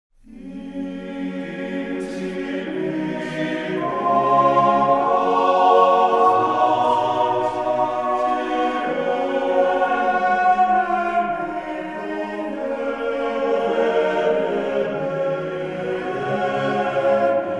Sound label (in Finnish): vetelinpoikakuoro; INCIPIT ORATIO